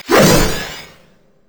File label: basic attack; Yasuo